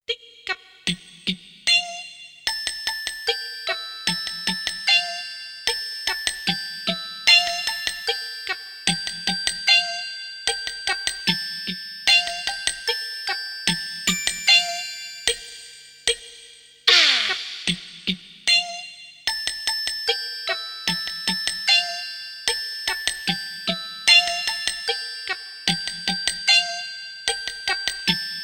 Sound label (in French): VERSION KARAOKE; Alex et Zoé 1